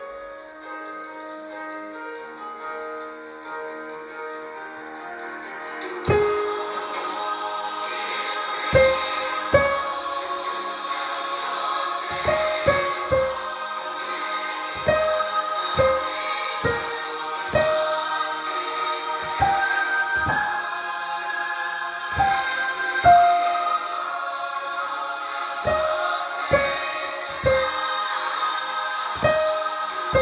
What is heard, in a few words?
BG Sopran, einzelstimmen